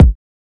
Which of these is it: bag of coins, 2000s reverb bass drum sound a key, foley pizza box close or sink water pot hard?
2000s reverb bass drum sound a key